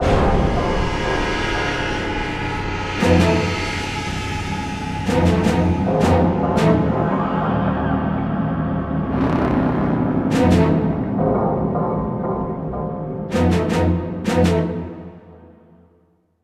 Lose; Silent Hunter II

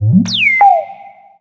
Droid Chatter; Zombie Catchers

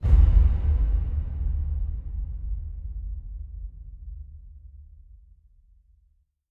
perc spot

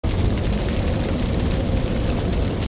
Fire Sound

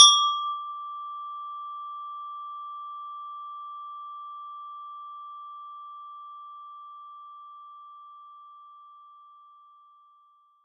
DX7 Strike

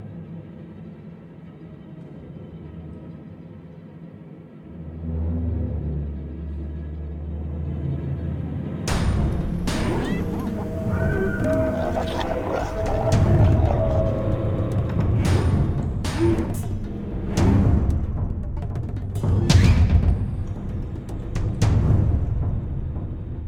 music Ghouls Combat

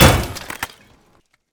metal collision; gamerip

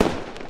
napalm sound; Arc of Templar

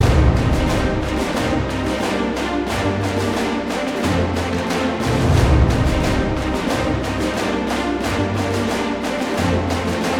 Explore Snare Short Loop; 3ds ps3 wii wii u windows xbox 360 gamerip 2012; Skylanders Giants

